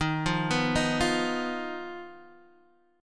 D sharp Suspended 2nd Sharp 5th D sharp, Guitar Open D; x,6,3,5,6,3; chordshape